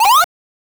weapon

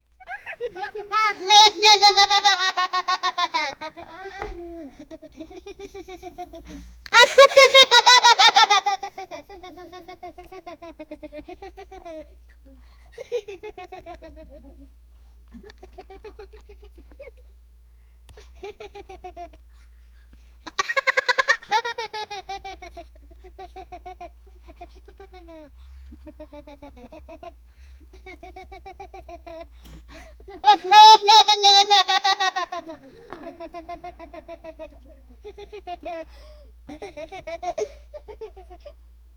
year old african, happy

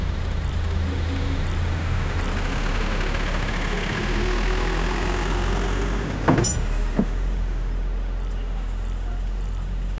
ambiance of, indian

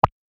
small pop ui appearing

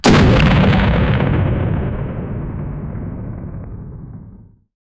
small rocket crushing and, explosion

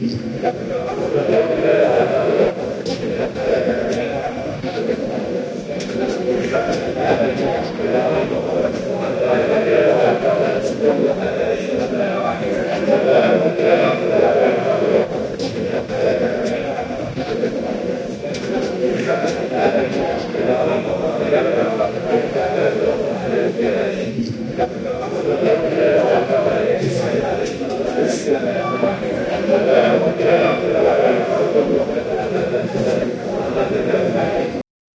crowded dwarven tavern lo

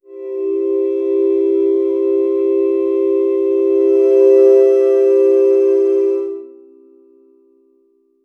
subtle glass harmo; sine; xerion

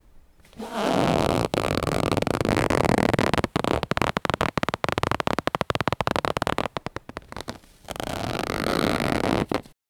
creeping sound wet fabric, creepy

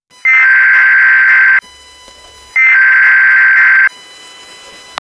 notification sounds of, social media

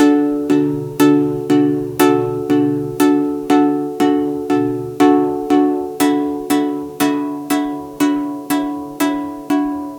ding dong, notification

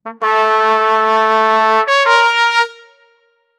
a du du du duuuuu, brass

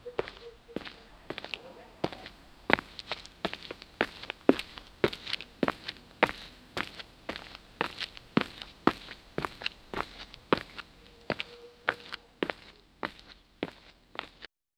very slow footstep of a

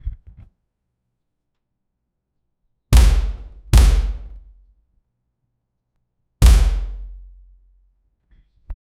the sound of a door, abrupt

